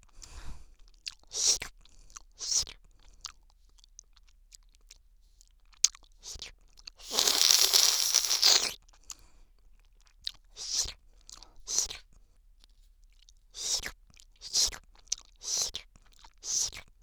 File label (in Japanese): 右耳アイス舐め